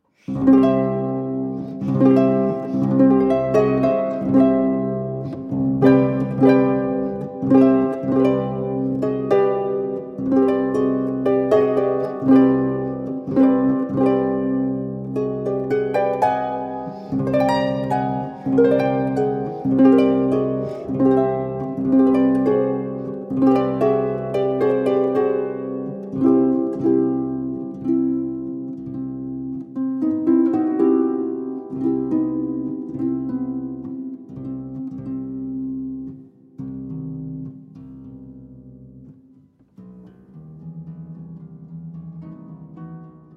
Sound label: Suite For Harp